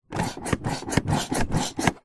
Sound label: hospital ward hand sanitiser pump plastic wall mounted several pumps